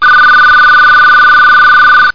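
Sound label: telephone; My Science Fair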